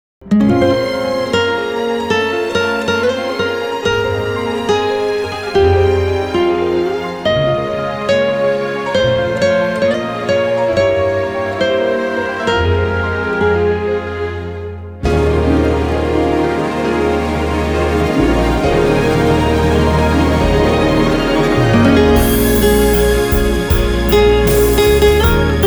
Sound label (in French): guitare